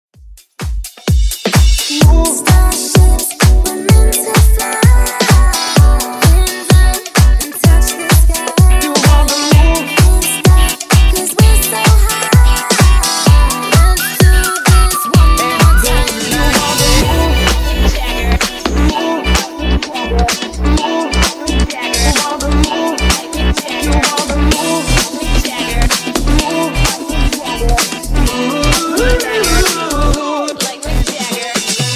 Funk Bootleg 128Bpm

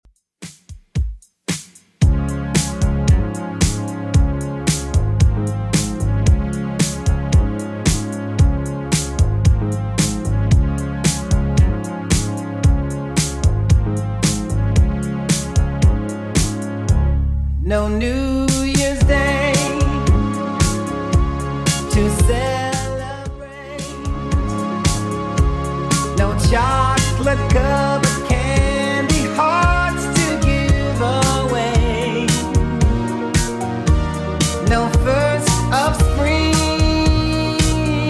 80s Redrum 113bpm

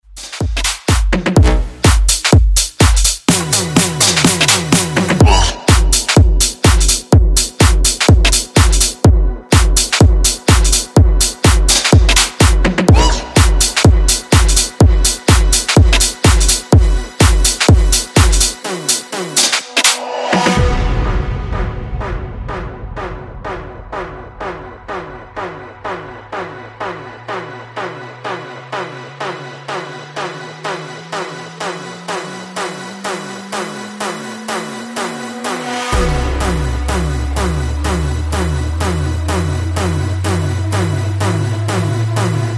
EDM Redrum

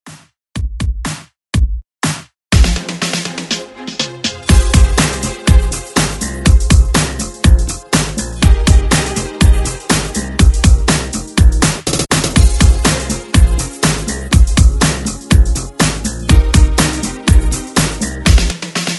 80s redrums

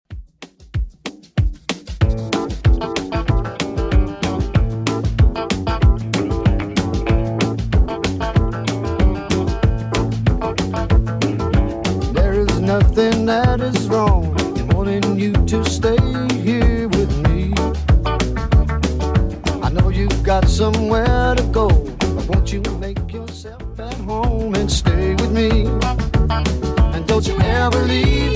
70s Redrum 189bpm

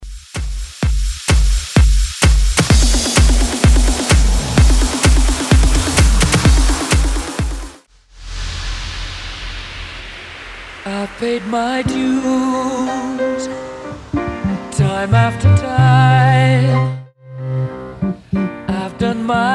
Smashup 128bpm

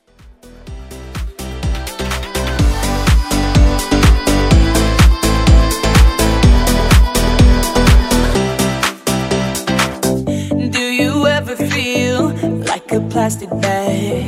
House

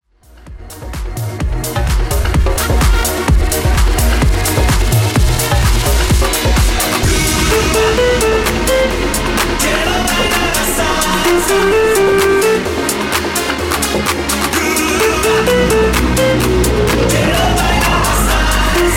Epic House Mixshow; djyan

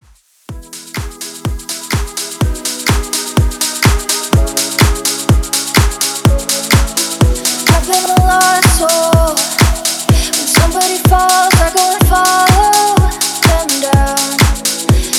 House Rework 125 BPM

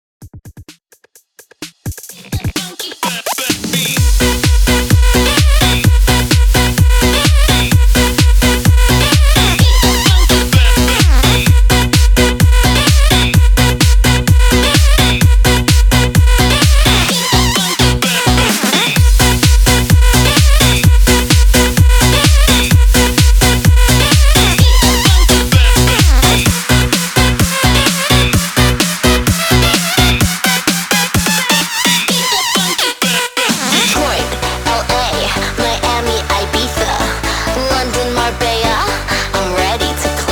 128 BPM

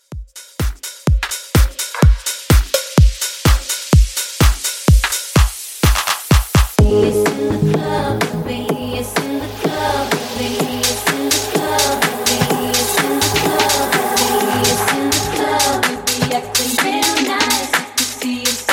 House Rework 126Bpm

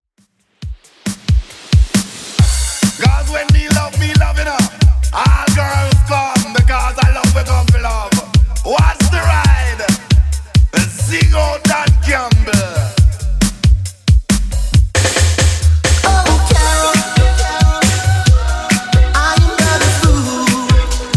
90s Redrum 136bpm

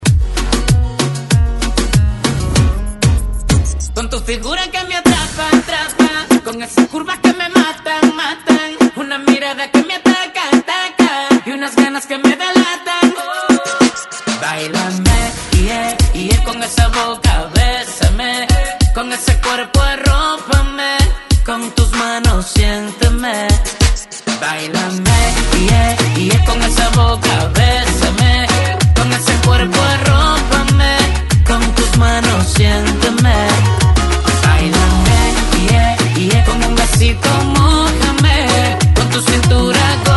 96 Bpm

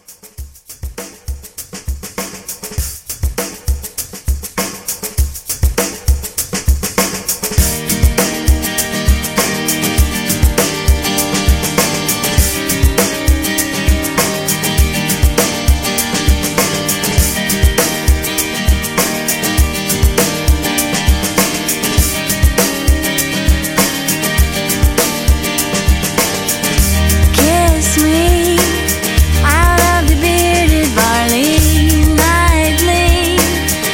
90s Re Drum

